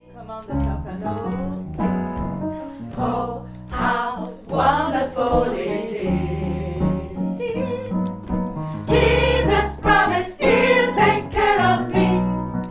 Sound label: soprano